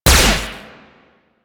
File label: Flux Rifle Lens Shutter; Crp Laser Rifle; BE Flux Rifle